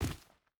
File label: footstep burlap stones jump land; Rust